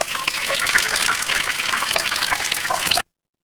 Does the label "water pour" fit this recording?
Yes